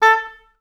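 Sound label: oboes staccato A3, orchestra; OBS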